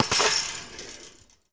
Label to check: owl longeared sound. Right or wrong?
Wrong